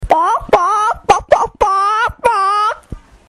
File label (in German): Huhn; harlaching